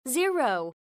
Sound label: Key Tone